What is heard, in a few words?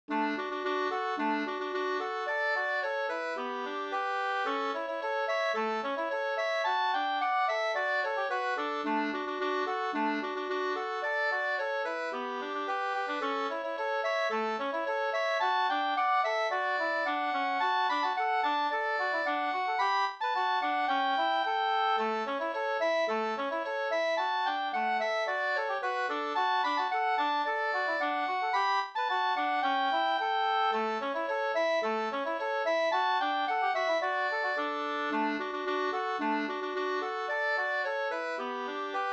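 polka, D major; fr Roros no Ddur 2st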